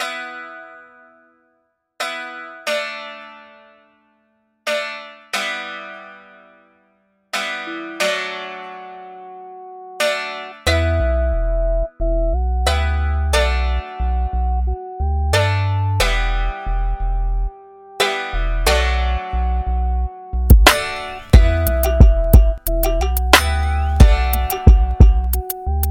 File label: Instrumental